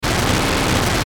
Static Warble Distortion, Glitch Warble Stutter

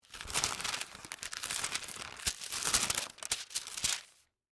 Bible Turn Page, Book; Foley, Bible, Turn, Page, Book, Long; BlastWave

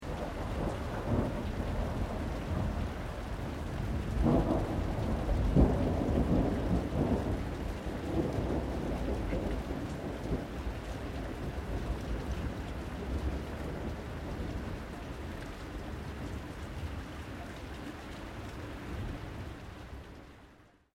THUNDER DISTANT LOW RAIN

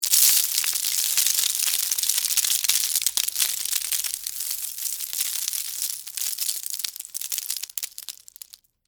Texture, Debris, Spill, Sugar, Cone, Waffle; Debris Sound Effects; Blast Wave

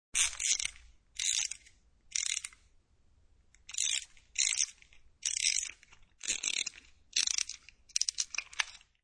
Winding up of toy.; Part of Wind Up Toys (Sound Effects:Toys)

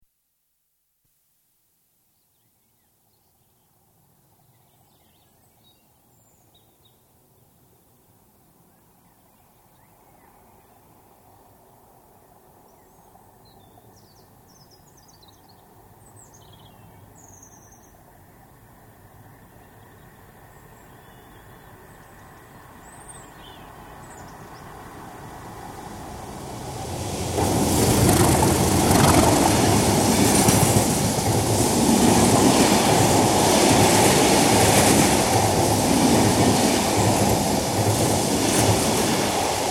Diesel Goods Train emerges from tunnel; Trains; Vehicles; BBC

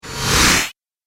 Astral Engines Sweep, whoosh; Andrew Fleming

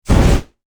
Flame Thrower Burst Short; Warfare; Miscellaneous Combat

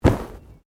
Body movement Fall Medium

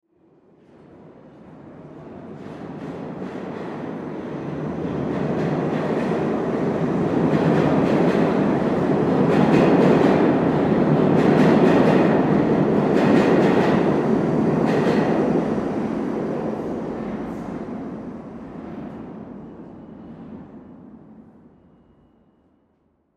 Subway Pass By, Subway Pass By Distant; Transportation; Subway Sound Effects; Blastwave

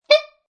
Sax Beep, A saxophone beep; Funny Musical; Sound Effects:Musical

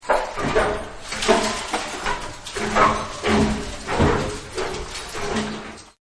Quiet Mayhem, Crunches; Objects

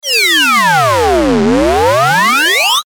Descend Ascend Distortion; Descending Sound Effects; Blastwave

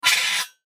Rollover Metallic Reverb; Mouseover; Spring Extract; Multimedia; Blastwave

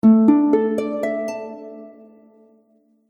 Harp Slow Arpeggio; Musical; Harp - Slow Arpeggio 1; Musical Sound Effects

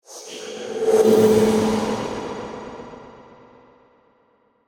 Ghostly Magic, Horror, Ghostly, Magic, Swirl; Ghosts; Blast Wave

